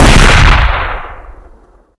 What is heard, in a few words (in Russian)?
kremnevyiy zamok iskryi i vyistrel, zvuk mushketa